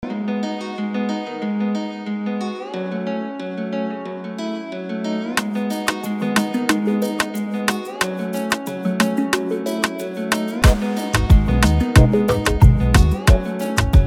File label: Afrobeats, guitars